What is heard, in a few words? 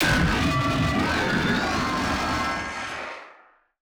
WOO WEE WOO WEE